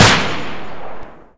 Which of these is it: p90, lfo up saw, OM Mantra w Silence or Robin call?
p90